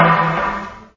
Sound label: die; Junkbot